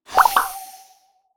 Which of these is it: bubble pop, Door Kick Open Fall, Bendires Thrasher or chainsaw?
bubble pop